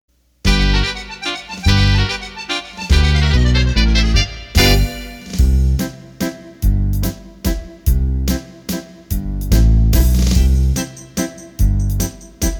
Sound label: Mazurka, accordion